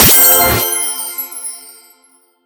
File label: Gem Collect; Skylanders Cloud Patrol; Arcade, iOS, Mobile